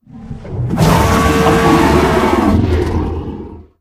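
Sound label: Amargasaurus sound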